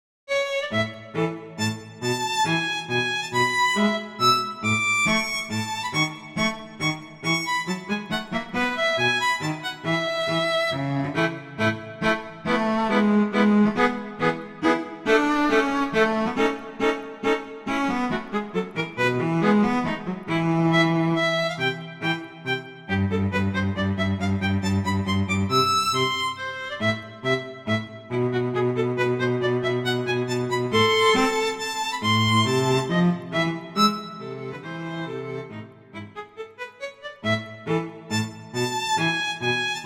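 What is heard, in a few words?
Minuetto, Allegro, violin cello; Mazas; Duets; Duet 10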